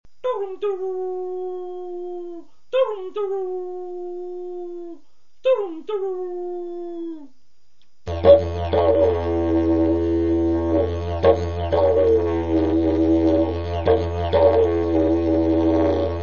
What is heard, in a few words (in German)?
Dingoheulen, Didgeridoo